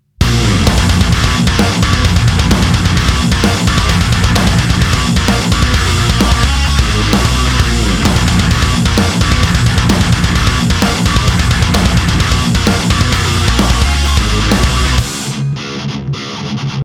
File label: metal; 57 onaxis